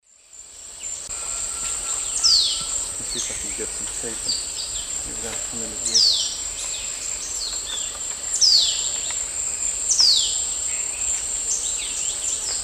Lanio fulvus, call; S. America; Eastern Lowlands

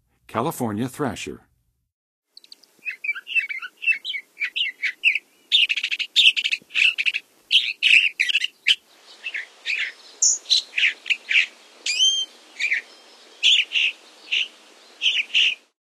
California Thrasher; North America; Western USA; Western USA Songbirds

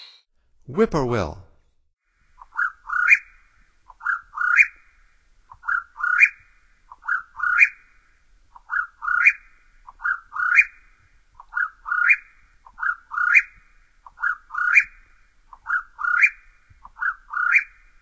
Whip-poor-will, Songs of Birds of North America; Stokes

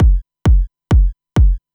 Kick Loop, Dance Beats

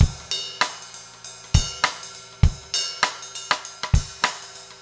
basic funk rims

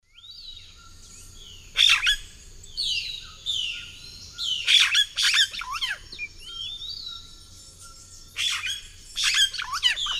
Cacicus cela, song and calls; S. America; Eastern Lowlands

